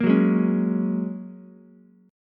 G sharp 7th Suspended 2nd Suspended 4th, Ukulele; G#7sus2sus4 strummed; 3,6,4,4; JGuitar

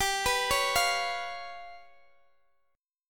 G 7th Flat 5th, Guitar, Open D

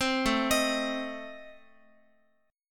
A Diminished A, Guitar; 4A,4E,5A,5D,5G#,6C#; arpeggiated; JGuitar

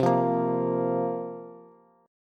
C 7th Suspended 4th, Bass; C7sus4 strummed

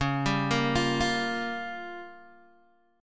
Db 6th Flat 5th, Guitar, Open G

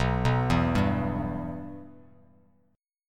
B Suspended 4th Sharp 5th B Bass Standard

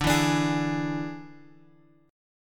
D Suspended 2nd Flat 5th D; Dsus2b5 Chord {X 5 2 X 3 4} strummed

